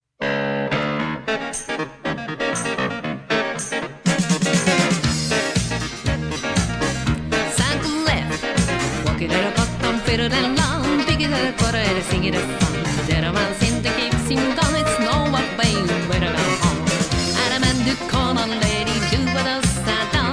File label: vocal